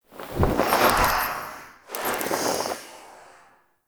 Protoframe Room Active To Inactive Idle, Ambience, Lab; Warframe; Tau